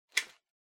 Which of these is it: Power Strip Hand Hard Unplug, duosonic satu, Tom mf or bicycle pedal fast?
Power Strip Hand Hard Unplug